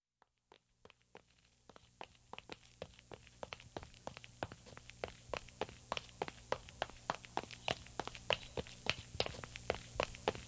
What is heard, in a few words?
footsteps